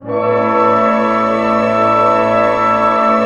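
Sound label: pentatonic A pianissimo, orchestra; Sound On Sound - Peter Siedleczek's Orchestra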